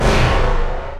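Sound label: large destroy; Parsec47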